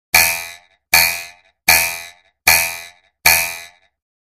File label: Bouncing Twang Wood